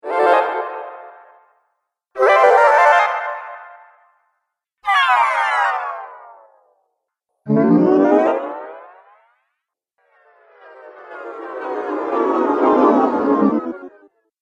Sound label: Amazing Magic Collection